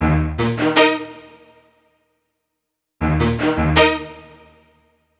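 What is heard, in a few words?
Hip Hop Strings, Ebmaj, 080 bpm; Pro Tools Essential Loops; Digidesign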